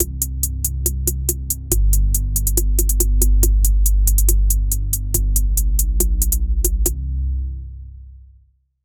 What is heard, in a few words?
dark atmospheric, 140 bpm